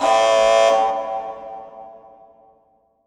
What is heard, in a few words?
ALARMS; Best Service; Advanced Mega Effects